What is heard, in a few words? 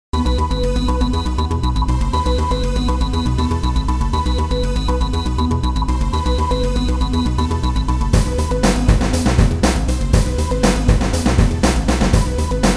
Hip Hop Intro Instrumental